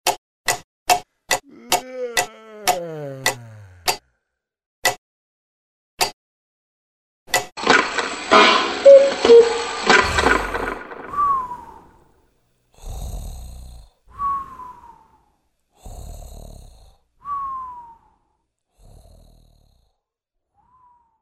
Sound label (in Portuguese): relogio